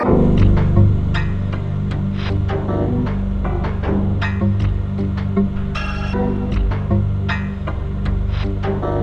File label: KORG WAVESTATION